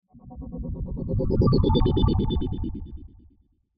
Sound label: Sci Fi Spaceship; Sci Fi Spaceship Hover Pulse; Spacecrafts